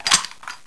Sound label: m134 pin pull, weapons